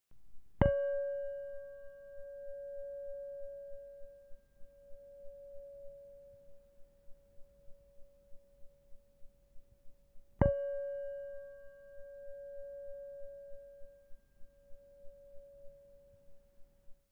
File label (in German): klangschale 13cm